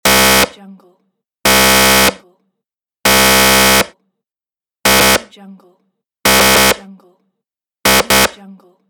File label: wrong answer buzzer; rawaudiolab